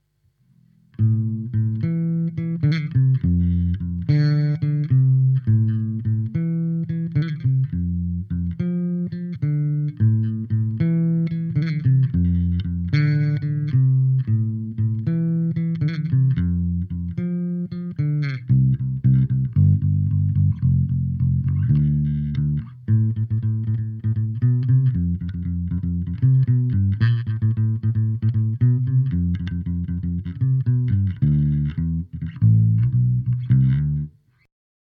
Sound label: Bass; lampes